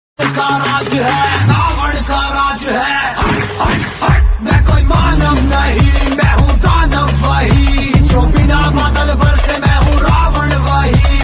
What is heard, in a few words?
ringtone song; ravan